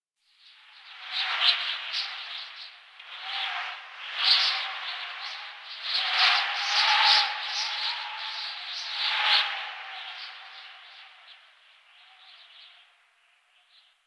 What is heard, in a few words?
Wind Gust, Ambience Plains; Warframe; Eidolon Plains; One Offs